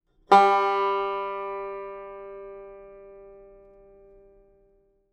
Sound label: banjo